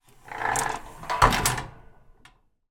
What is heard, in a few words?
household loft hatch and ladder open pull down; zapsplat